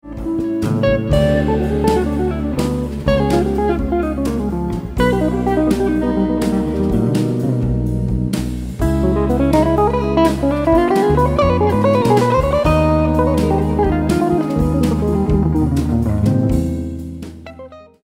assolo, guitar

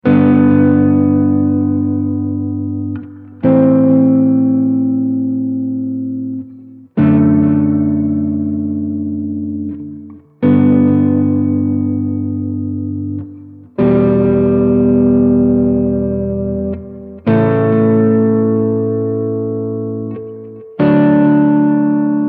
accordi; Bach

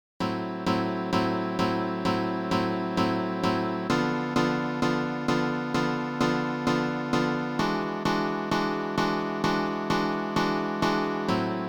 accomp sostituz accordi

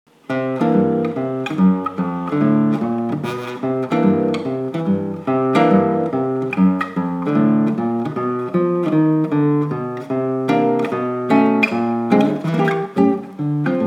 Esercizio, guitar

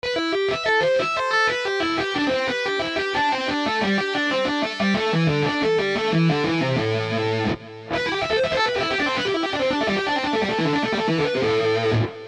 Esercizio Tapping Lick B, guitar

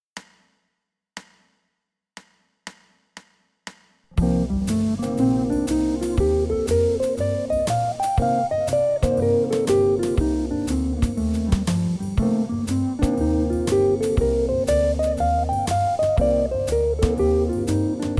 Box 5 modo misolidio

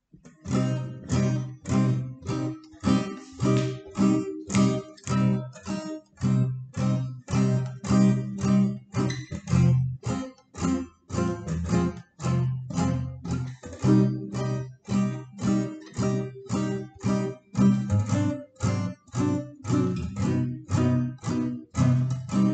Blues minore

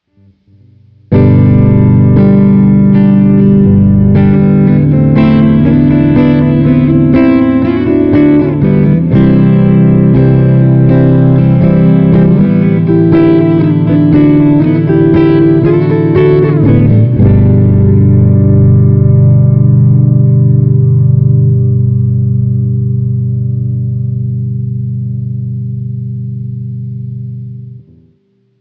Esercizio, guitar

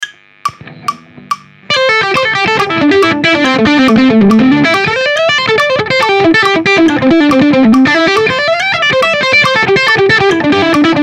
Dynamic Rock Hybrid Picking e Pentatonica

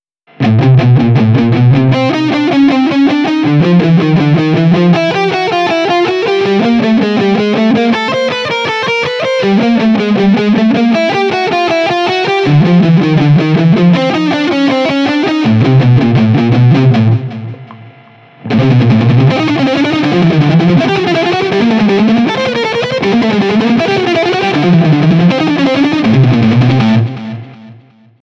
crom salto 2 corde

